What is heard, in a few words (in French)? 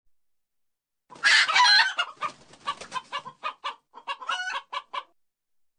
poule